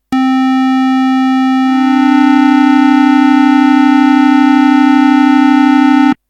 grosse sekunde; harmonie

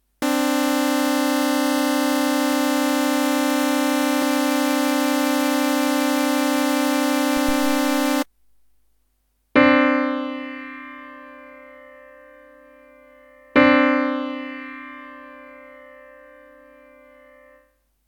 grosse sekunde; harmonie

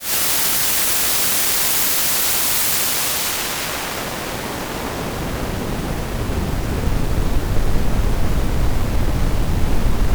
rosa rotes rauschen; harmonie

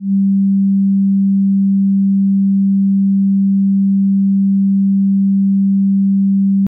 sinus; harmonie

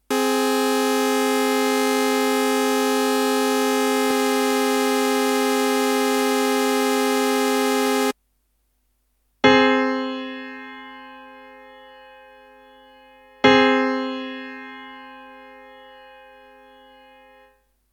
kleine sexte; harmonie

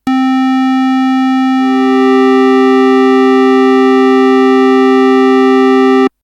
Quinte; harmonie

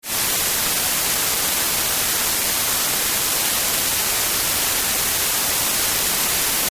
weisses rauschen; harmonie

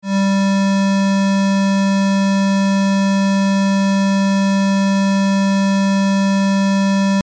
rechteck; harmonie

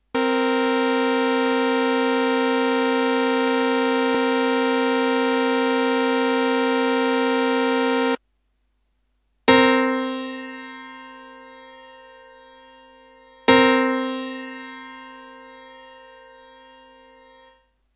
kleine septime; harmonie

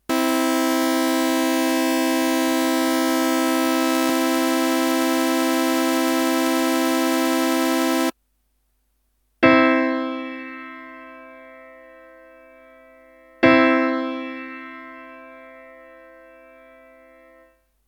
grosse terz; harmonie

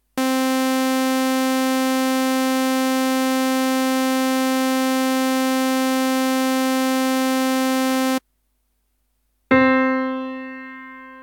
prime; harmonie

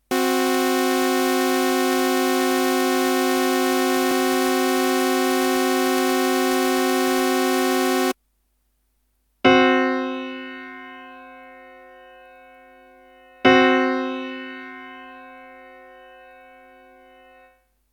tritonus; harmonie

